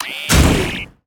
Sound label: Drill Golem Attack